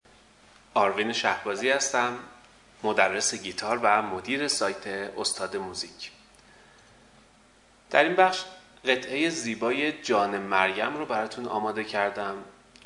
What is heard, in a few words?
Guitar